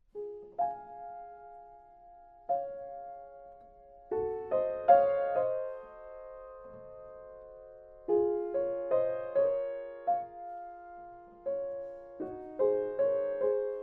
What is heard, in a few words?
Piano U67